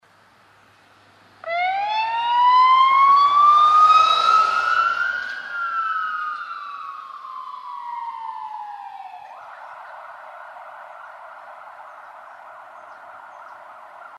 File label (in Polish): Karetka